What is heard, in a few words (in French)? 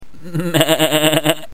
Chevre